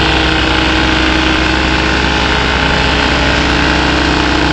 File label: spin loop low, manhack; hl2